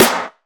dirty acoustic snare g key, snare drum tuned to the G note